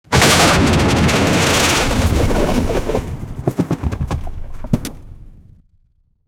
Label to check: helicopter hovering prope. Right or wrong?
Right